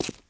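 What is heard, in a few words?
boot wood walk right, footsteps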